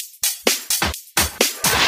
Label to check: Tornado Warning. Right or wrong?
Wrong